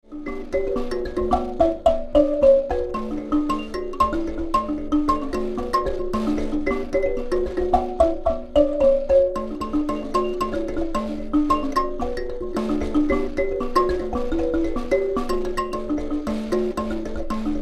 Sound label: mbira